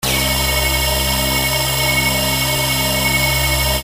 ACTIVATE; ecrins; Mars

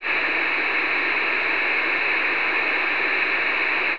static

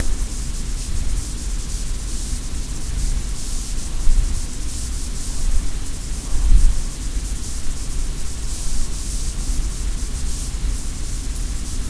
wind

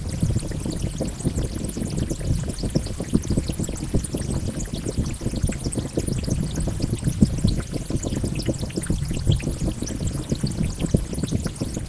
fire

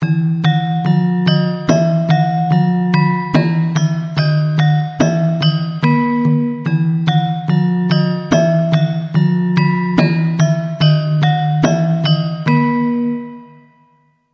balungan, gamelan

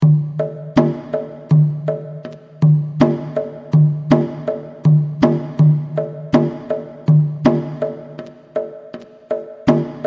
kendhang, gamelan

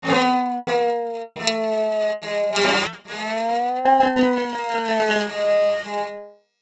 string; Modalys

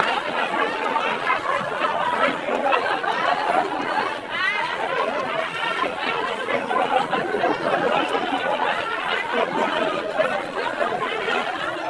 laughter

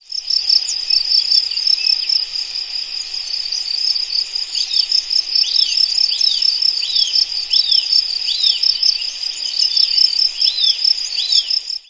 crickets original

